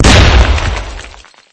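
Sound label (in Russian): взрыв бомбы с разлетающимися осколками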